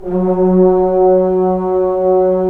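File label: brass trombones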